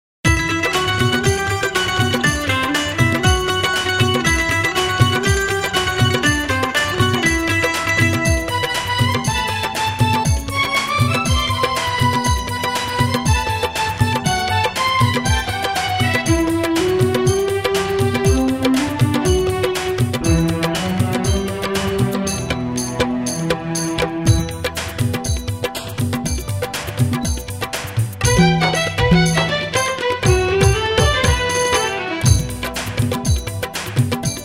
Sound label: Karaoke Music